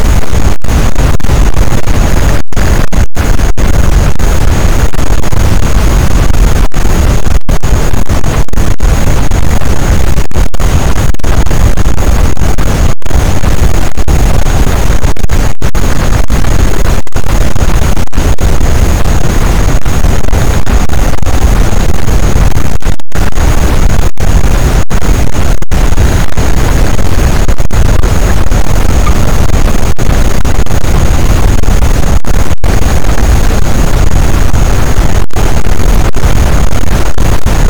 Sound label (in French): cachalot, boat; Physeter Macrocephalus